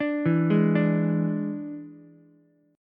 D Suspended 2nd Flat 5th D, Ukulele; 7,4,4,5; arpeggiated

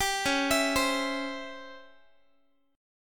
Db Minor Major 7th Flat 5th Db down Guitar; arpeggiated; 22,21,19,22,x,x

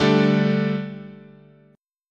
D Suspended 4th, chord

